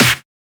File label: Snare; FLPRO; Snares